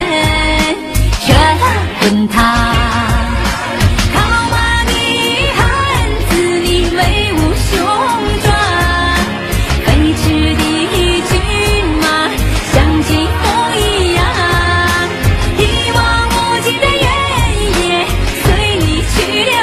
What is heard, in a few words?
beautiful chinese ringtone